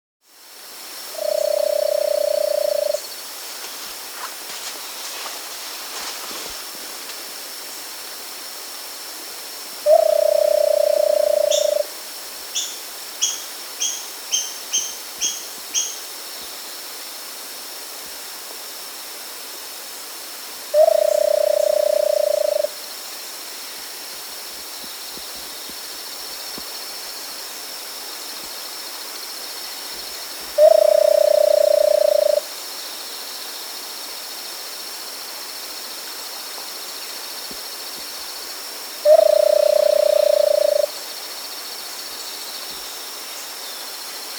Versicolored Barbet (Eubucco Versicolor), Andean Birds; S. America